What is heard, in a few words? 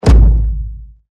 crude hit